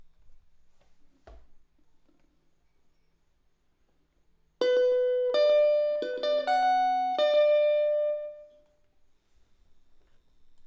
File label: Elect Guitar